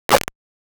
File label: snare; goodtakimu drumkit; fl studio; drumkits